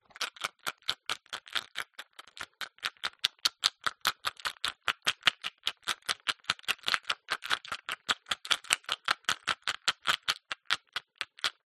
batteries aa shake long steady slow down at end rattle; skyclad sound